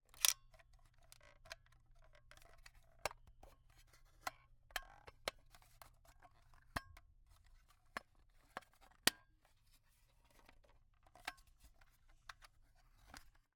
Camera, Aimex SP500, point n shoot, plastic, fiddle, handling; Eiravaein Works; Vaeyan IV